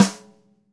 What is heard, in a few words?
Ludwig Supraphonic Snare, One-Shots; Sonic Foundry (Sony Creative Software) - Vital Drums